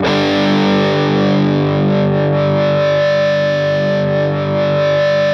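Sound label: fuzz chord, fuzz guitar vibrato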